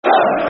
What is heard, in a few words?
Scum, Football Chants; fanchants; Ipswich Town Itfc